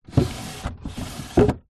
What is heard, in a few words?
drawer open close bedside cabinet wooden underneath perspective; household; zapsplat